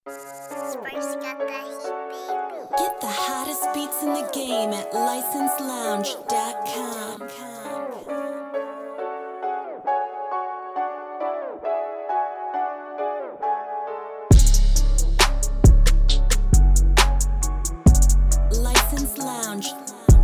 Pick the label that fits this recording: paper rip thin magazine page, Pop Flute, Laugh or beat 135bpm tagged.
beat 135bpm tagged